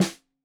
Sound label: Snare 65 Reg Stereo, Deeply Sampled Drums